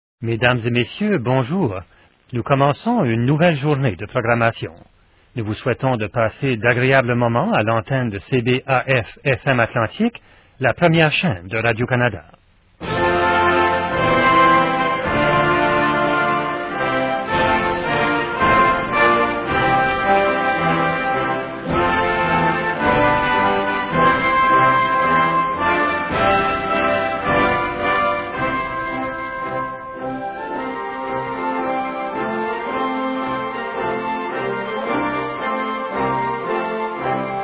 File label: interval signal; can-radio canada la premiere atlantique